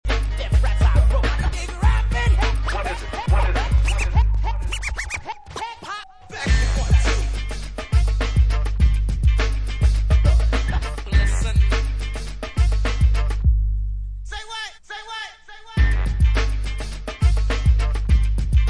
Instrumental

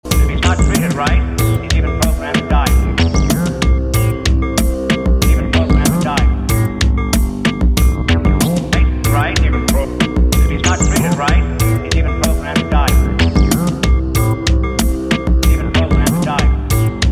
Bass Machine w The Horn

